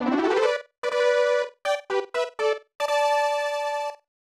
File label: Level Up; Metal Max 2; snes